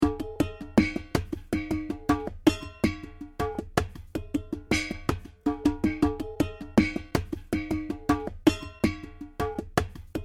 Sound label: assorted cans